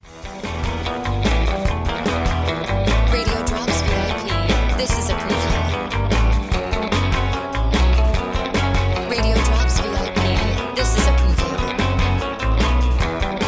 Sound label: Music Bed